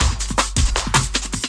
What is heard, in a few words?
loop, drum & bass, 160 BPM